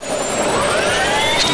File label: scan